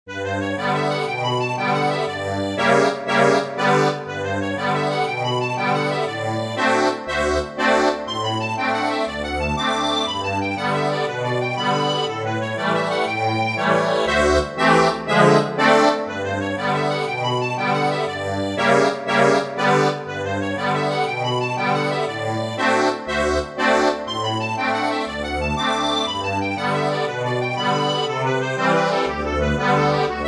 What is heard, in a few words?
ACCORDION